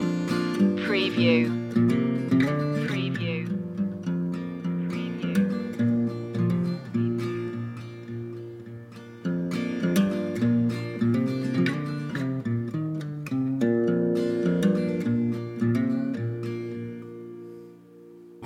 barn raising loop

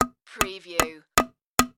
Hammer Nail Wood

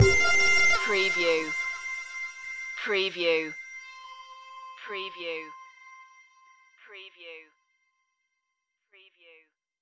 retro electro loop ending

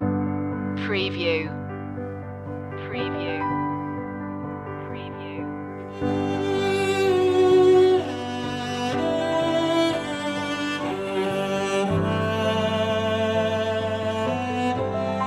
piano cello; Saint-Saens; The Swan; Carnival of the Animals

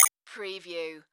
Multimedia Beep

